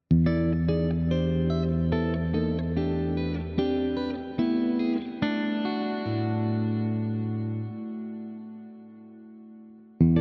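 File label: ESP LTD TE 1000 Clean, guitar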